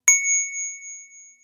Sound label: message notify